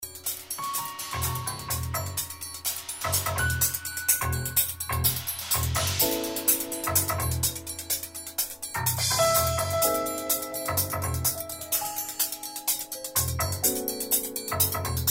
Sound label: interlude